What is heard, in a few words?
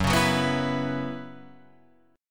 Gb 7th Suspended 4th, Guitar Open F; Gb7sus4; strummed; JGuitar